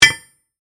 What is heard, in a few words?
Impact Metal Heavy, Weight, Drop, On, Metal; Crashes